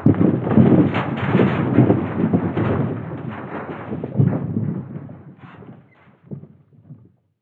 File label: church; ambient; inferno